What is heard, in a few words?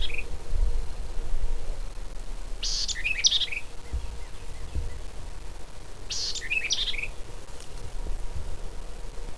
White-eyed Vireo